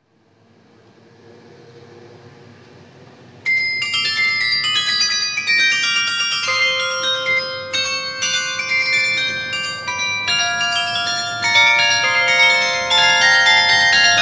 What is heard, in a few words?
carillon; Allerlei 2004-2005